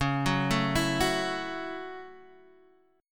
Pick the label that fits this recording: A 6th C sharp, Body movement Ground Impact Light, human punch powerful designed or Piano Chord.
A 6th C sharp